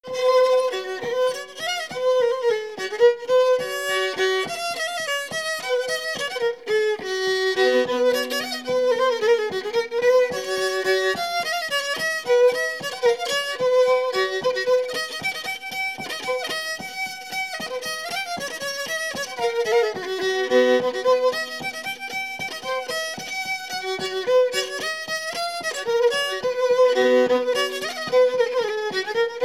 Bourrée Du Piano Mécanique

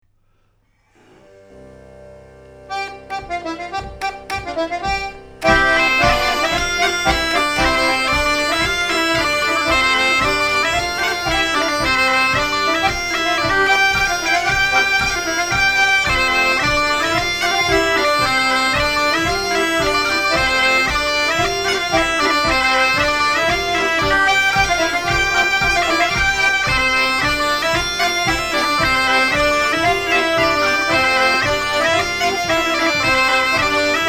Polka piquee Instr Do sans arret x5

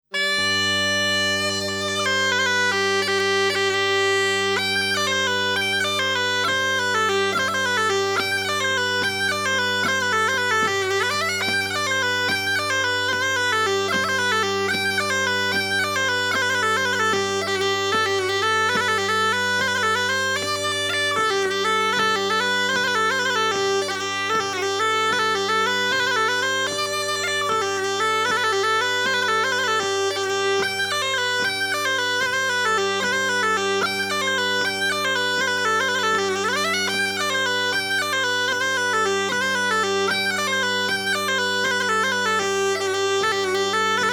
Bourree de l orage

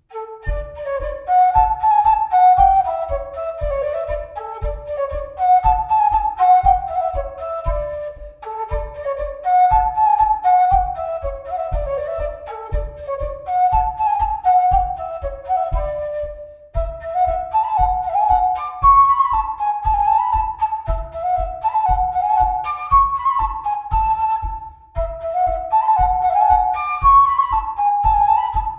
Bourree; Recueil De Raoulx

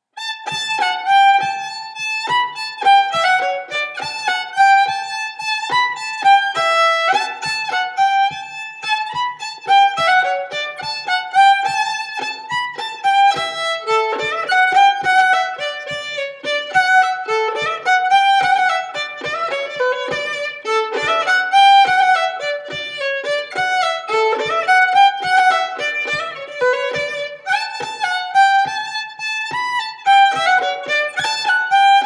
Montagnarde; Recueil Hainl; Montagnarde de Dyane et de Besse

